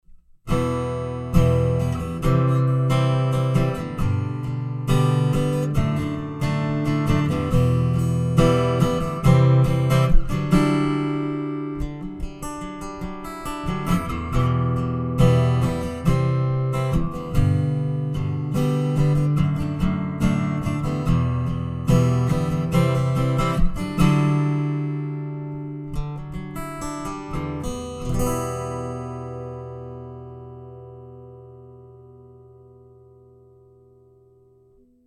Fishman Aura Spectrum Dreadnought Strumming Ibanez AW54